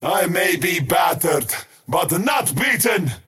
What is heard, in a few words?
skywrath crown deny pain; skywrath mage